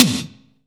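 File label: SDS V SNR, snare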